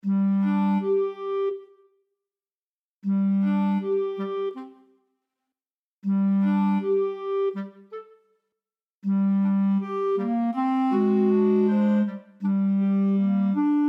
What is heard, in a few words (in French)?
Clarinettes; Paradornitho